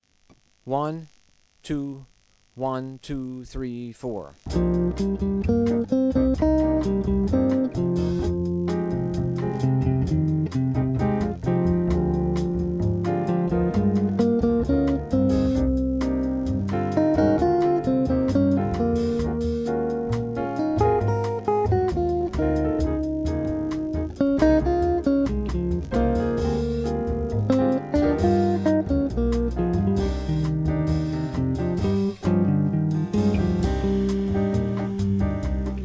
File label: Penta Improv